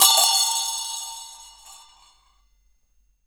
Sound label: BO; INIS - Opium; PERCS-KIT